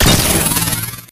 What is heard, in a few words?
Nuke Crit Impact; AI Stalker; Titanfall 2